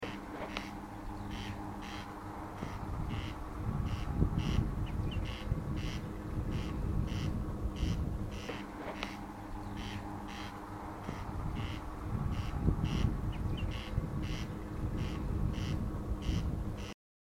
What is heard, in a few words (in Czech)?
tetrivek obecny